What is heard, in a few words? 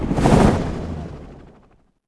Gunship Fort; Civ4 warlords